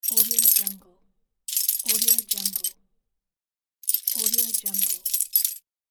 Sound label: door keys